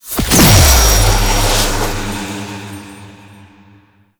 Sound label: Thurible Protection Start; Lyon; Warframe; Tau; Roathe